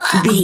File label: voice female hurt pain choke